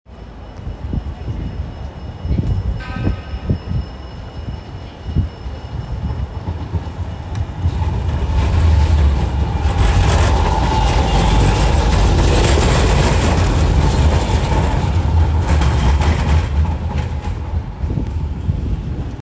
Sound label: tram, Rotterdam; Rotterdam Field Recordings